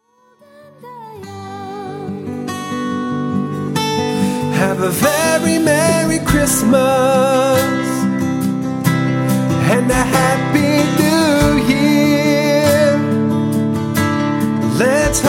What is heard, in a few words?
happy christmas